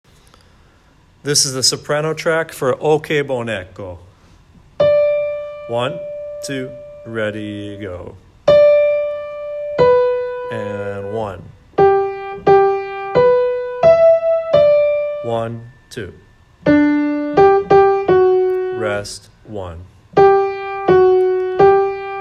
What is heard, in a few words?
eccho - sop